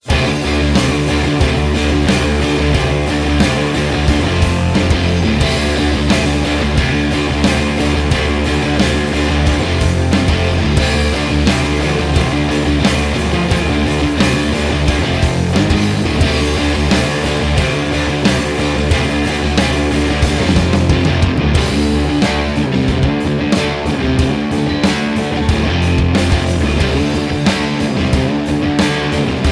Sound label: Space Christmas Jam